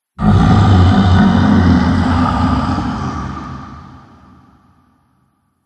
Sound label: Zombie Grunting; horror; Zomby Sound Effects